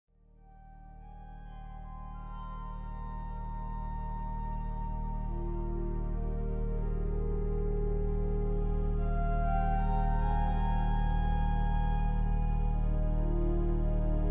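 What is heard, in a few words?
Offertoire, orgel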